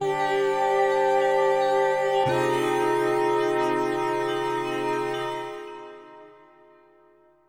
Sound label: SYNTH PAD AMBIENT 160 C, Ambient; eJay; Sphere